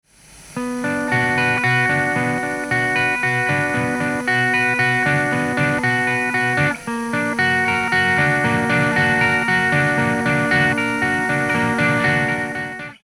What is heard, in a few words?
clean